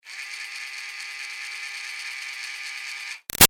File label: Toy Fairy Wand Med Far Run Short; Miscellaneous Toy Sound Effects; Big Room Sound